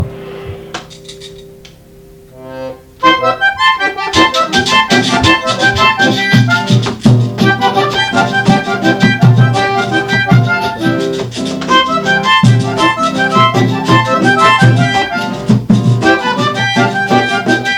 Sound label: RITMO MERENGUE